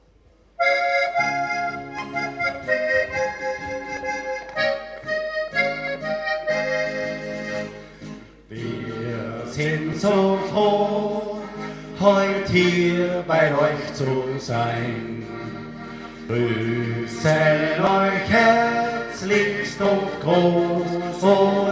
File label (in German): Oberkrainer Medley